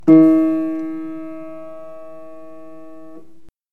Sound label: Piano